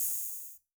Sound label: Open Hat